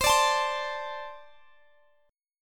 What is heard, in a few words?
C 6th Suspended 2nd C